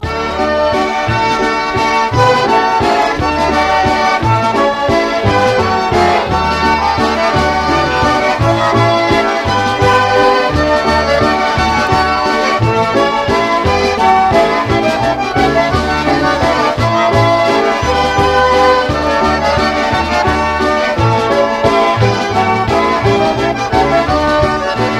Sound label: Polka